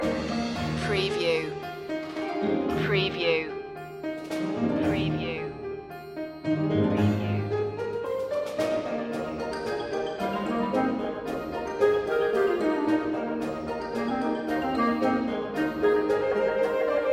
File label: toys marching loop